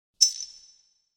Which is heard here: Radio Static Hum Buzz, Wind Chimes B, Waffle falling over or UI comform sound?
UI comform sound